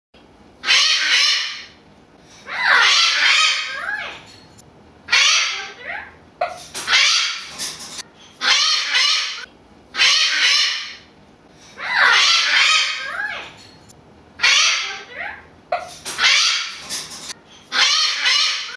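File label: Blue Throated Macaw, parrot calls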